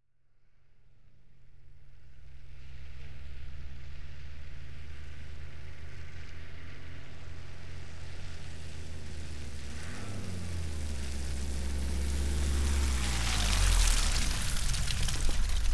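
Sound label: Warfare, Landrover Exterior Approach; BBC Sound Effects Library; Explosions Guns Alarms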